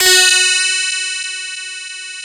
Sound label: DS8 F#4, Korg; Back In Time Records; Korg Universe VOL-1